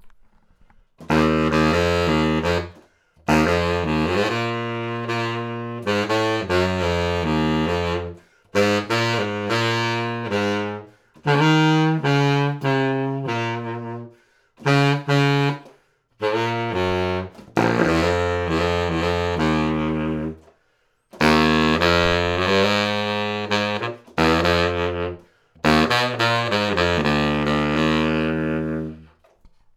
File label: selmer bass